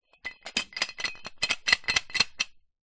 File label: metal large pole loose parts movement shake; foley